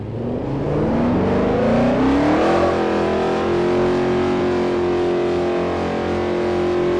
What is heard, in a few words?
rev, vehicles; TDMCarpack; slsamg